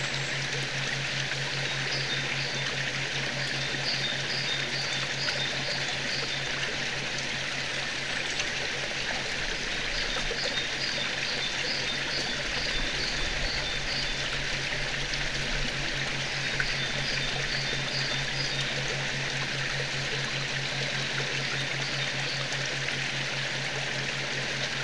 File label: Runnel Birdsong